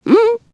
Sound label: ehgulp